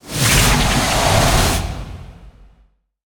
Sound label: Monster Cutin Wind